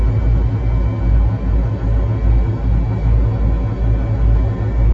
worked, machine; tile

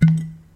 barrel open; tile

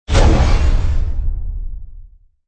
walk, robot; mob

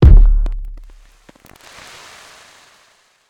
fall wo damage

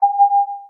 beacon

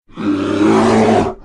bear grunt; mob

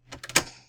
safe out; tile

